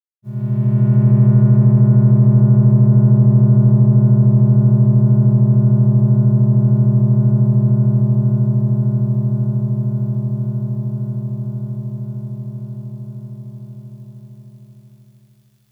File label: CHORD PAD; Dune Valley